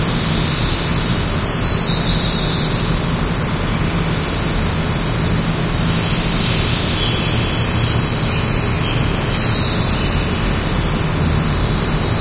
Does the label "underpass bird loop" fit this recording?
Yes